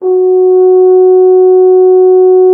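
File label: French horn F#3; Xsample VOL-3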